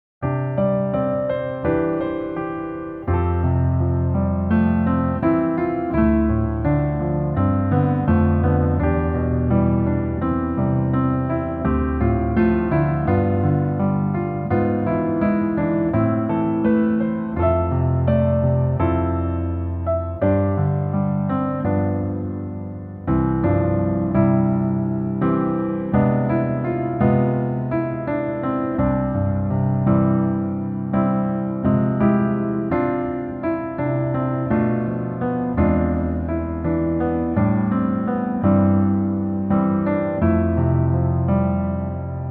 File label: Piano ver